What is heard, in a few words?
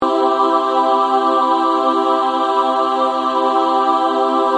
moving In Proportion Choir Loop